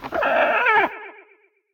phoenix base chirp; smite; npc

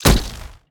whip hit; smite; osiris

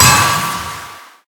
hit silence; smite; thanatos

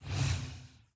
giant run cloth; smite; vamana

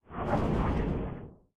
float; smite; thanatos

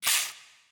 armor; smite; arachne skin9

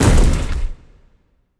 bodyfall; Smite; god Khepri

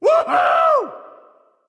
yay; smite; voicepack; ullr

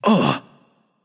ouch; smite; voicepack; hachiman

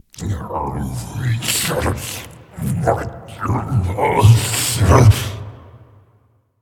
grumbling; smite; kukulkan

